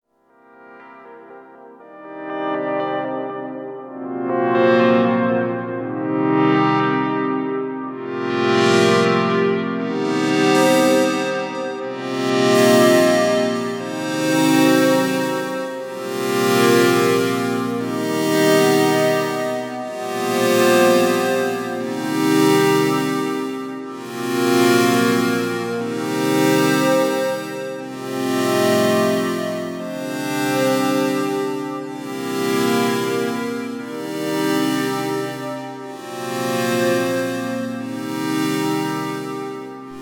Dreadbox Telepathy Bundle All Voices Mono Stereo Invert Reverb